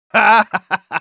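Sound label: laugh evil, German; Medic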